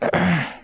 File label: exert; blindside